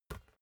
basketball catch light; sport-freizeit

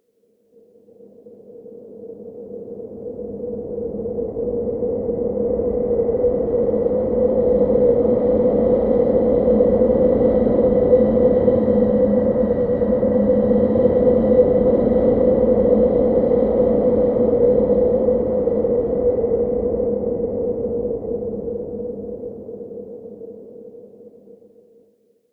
wind across plains; flaechen

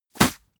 spit; soundpool

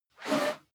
whoosh whistle

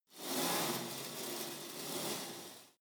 pullup machine cable pull soft; sport freizeit; fitnessstudio

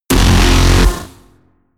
distortion

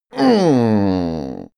male grumble strain release; menschen; koerpergeraeusche; soundpool

